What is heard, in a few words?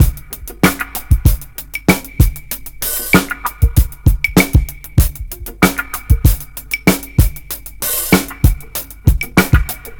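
drum, Breakbeat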